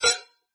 Ting; Ki11er Clutter

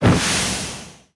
start sound

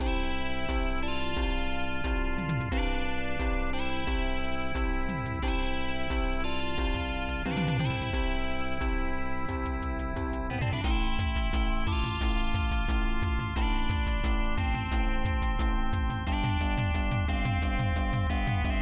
Victory; Sega Ages Herzog Zwei

